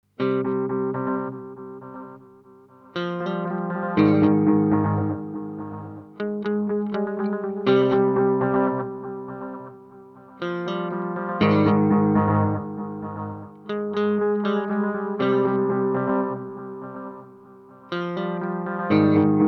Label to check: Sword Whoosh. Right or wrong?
Wrong